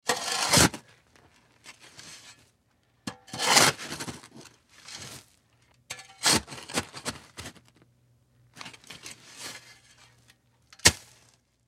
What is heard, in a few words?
Shovel Dirt, Shovel Dirt Multiple; Yard Tools; INDUSTRY; Blastwave